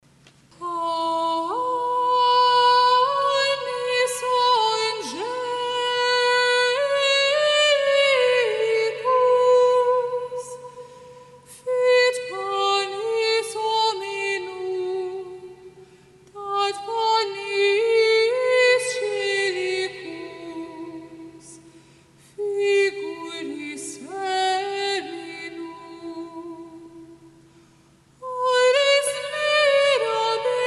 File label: Panis Angelicus – Gregorian Chant